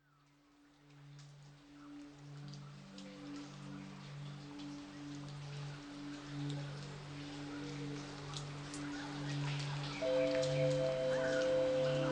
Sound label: Tropical Rainforest Retreat Zen Spa Music